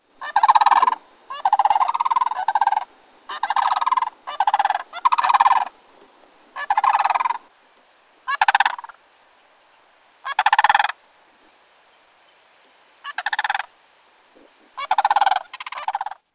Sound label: sandhill crane